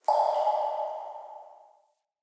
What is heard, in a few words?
Root Cave Magic Drip; Ambience; Neathuns Root Cave